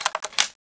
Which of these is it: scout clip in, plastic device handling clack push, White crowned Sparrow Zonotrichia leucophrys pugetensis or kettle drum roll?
scout clip in